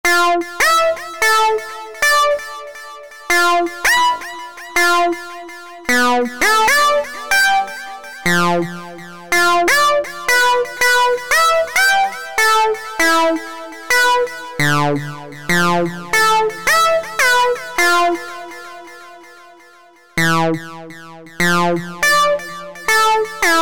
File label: Bass